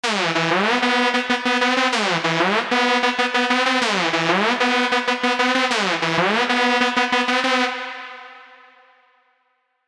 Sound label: Reverb - Synth Lead - Bright Room; Synth Lead 2; CRTIV Reverb